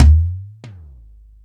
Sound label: Toms 1, drum machine